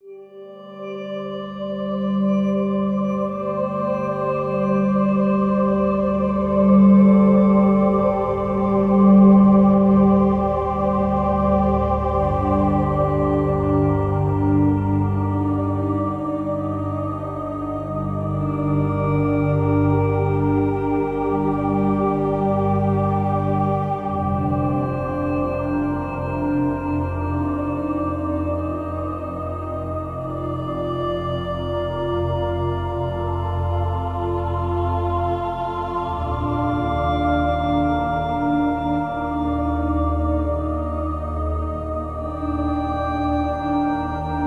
Long Ambient; Rise of Nations: Rise of Legends; Sawu

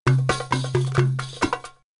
Drummer, pinball; Shaman

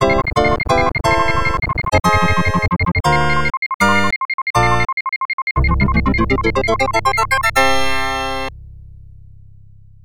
Game Clear; Mega Bomberman; Genesis

